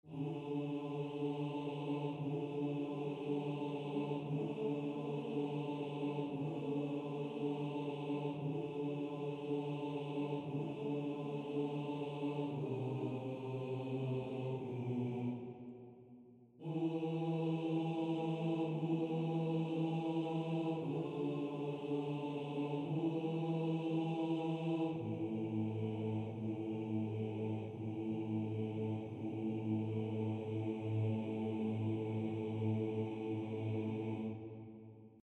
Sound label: Bass